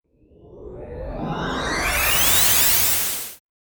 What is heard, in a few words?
soft launch panel